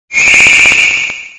Grito de Blipbug; Pokémon; Gritos de Pokémon de la octava generación

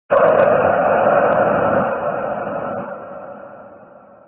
Grito de Charizard Gigamax

